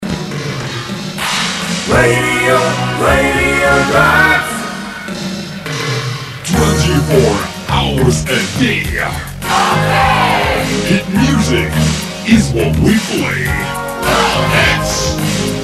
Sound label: Radio Jingle; RadioDrops